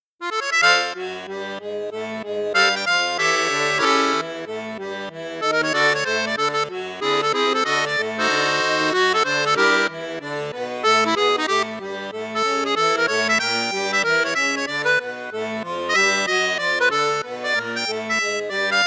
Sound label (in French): registre flute juste, accordeon; maugein mini sonora